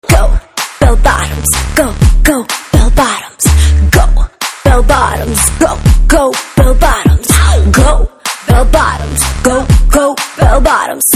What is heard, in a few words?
Theme BGM Ringtone